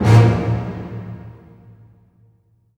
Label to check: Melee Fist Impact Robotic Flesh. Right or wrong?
Wrong